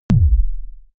sub kick noise short

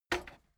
cassette eject push heavy

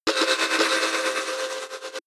drill synthetic

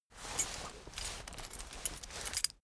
key ring mid size pocket fetch long

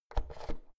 oven knob press in

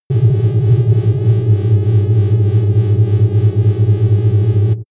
space alien machinery close

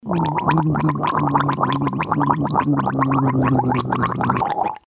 gargling voiced

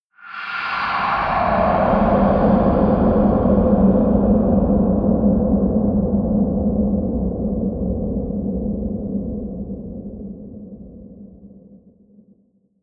air sweep long deep

